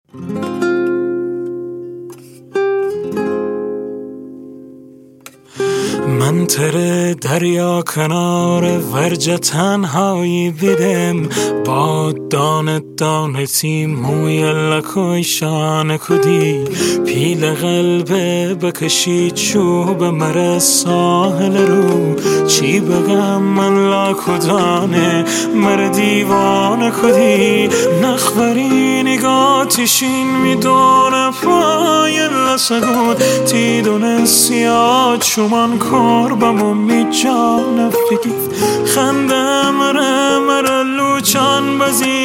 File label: Guitar Version